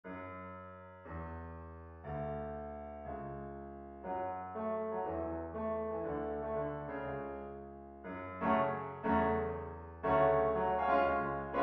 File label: swing